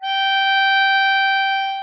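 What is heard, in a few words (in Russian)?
harmonica long note with air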